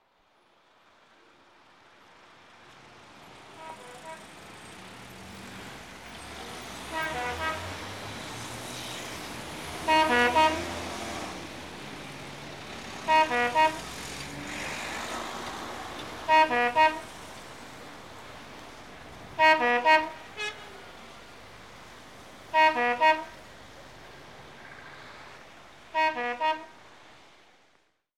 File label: Ambulance